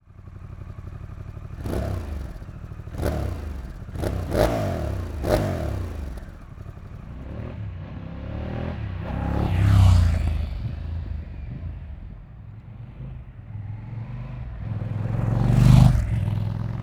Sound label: Pan Am Open